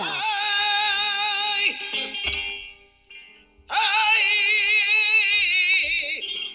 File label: ayyyyayyyayyy